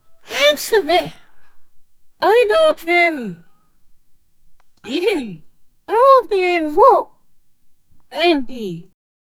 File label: woman choirs